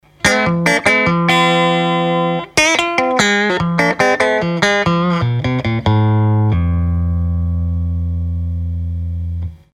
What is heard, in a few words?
Neck + bridge clean, Fender Japan TL; Fender Japan TL 43